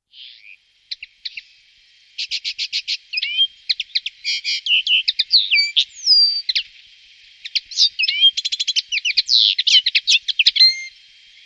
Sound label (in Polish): makolągwa - jeszcze inne motywy